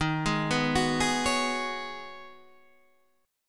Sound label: B 6th Add 9th D sharp, guitar chord